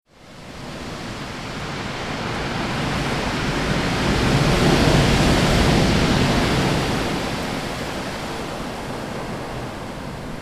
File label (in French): grotte antifer